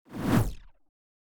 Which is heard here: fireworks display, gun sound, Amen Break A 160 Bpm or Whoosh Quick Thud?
Whoosh Quick Thud